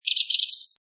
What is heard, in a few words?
Flutter, Little bird tweeting, chirping; Birds; Animals; LynneMusic